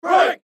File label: crowd break